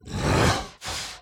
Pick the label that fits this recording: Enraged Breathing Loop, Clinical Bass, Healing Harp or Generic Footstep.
Enraged Breathing Loop